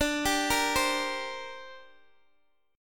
D 7th Suspended 4th Sharp 5th, down, Guitar, Open D